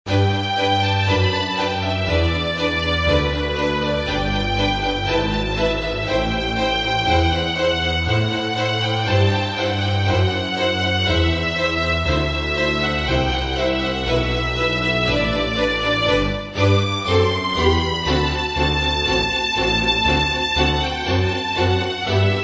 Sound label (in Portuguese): hino do sao paulo violino